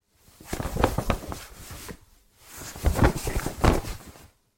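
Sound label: packing luggage sounds; zvuki chemodana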